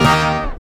STAB; E-Lab; X Static Goldmine 1

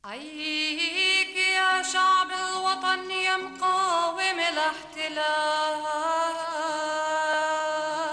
VOC

